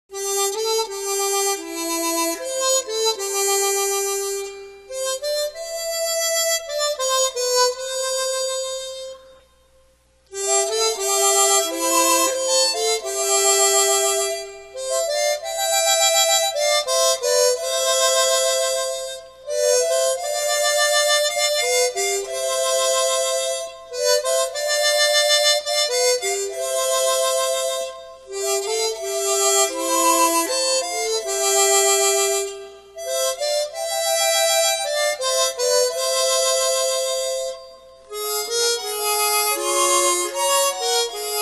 Favorite Hymns On Chromatic Harmonica; Rock Of Ages Cleft For Me

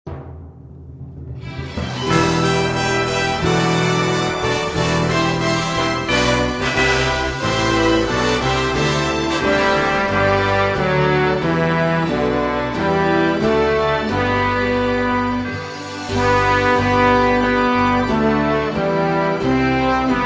Instrumental Hymns

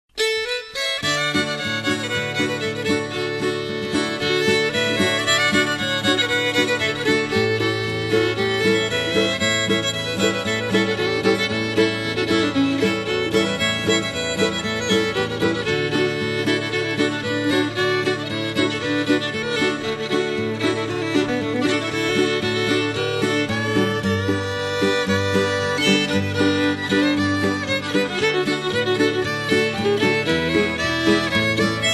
old time gospel instrumentals

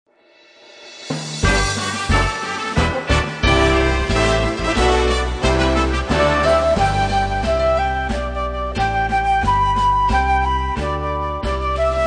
Instrumental Hymns

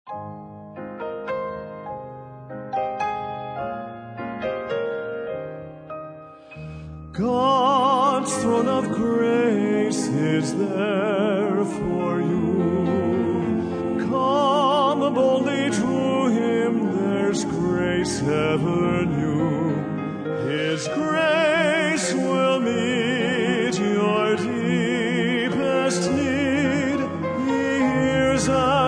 Men's Quartet

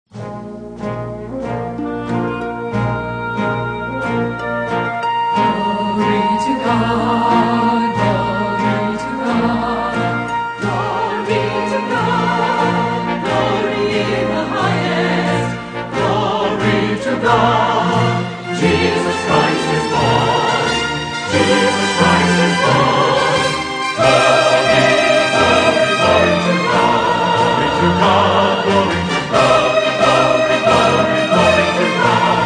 Chorale And Orchestra, Glory To God

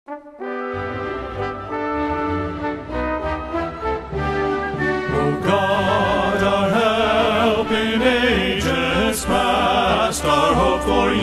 men's chorus

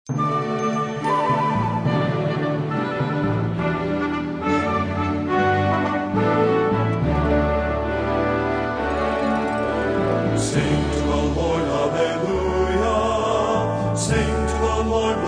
Trio, Sing To The Lord Alleluia

